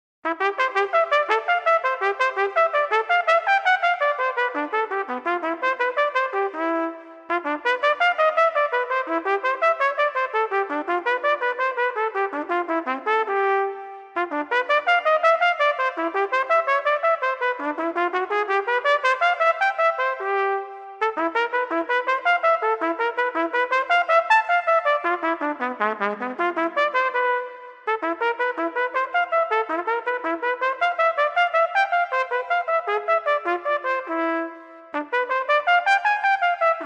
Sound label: Short Staccato Studies